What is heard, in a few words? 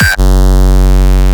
KICK dont